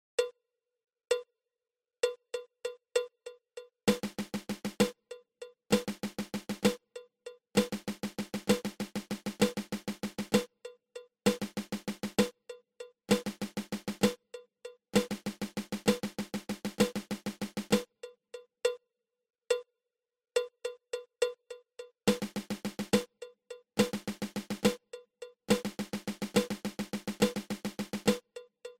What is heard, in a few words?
Flam Paradiddle diddle